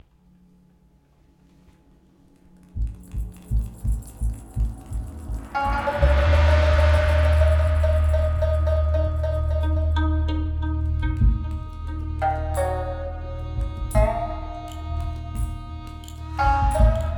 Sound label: Calm Ninja